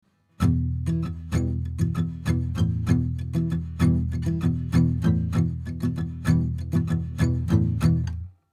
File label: Filter 80Hz Acoustic Git Deep 20cm 15 Fret; Austrian Audio OC18